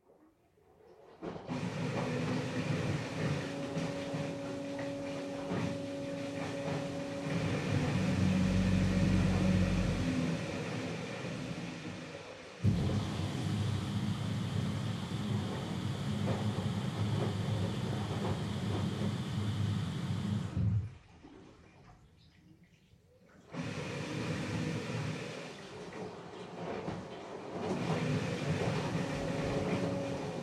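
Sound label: Washer Front Load Operation Noise Far Wash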